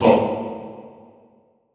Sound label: TONG A1, Extended Classical Choir; Best Service; SHORT TONG